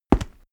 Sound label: step stone walk; schritte